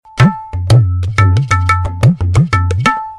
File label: tabla classical ringtone